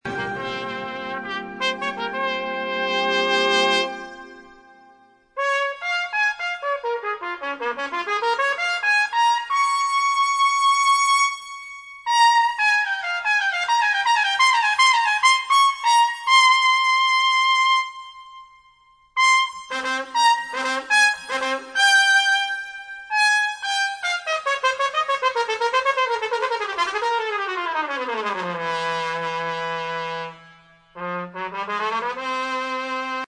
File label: cadenza